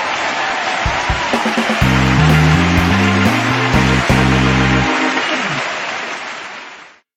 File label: race finish victory; SuperTuxKart